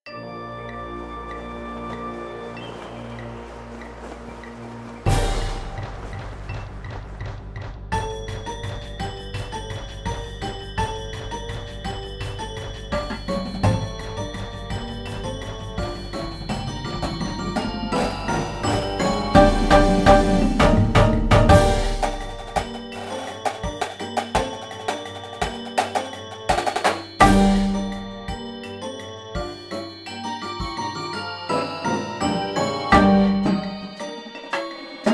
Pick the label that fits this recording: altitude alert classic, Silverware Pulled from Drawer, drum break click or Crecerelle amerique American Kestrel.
drum break click